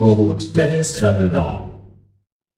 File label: magic scifi shield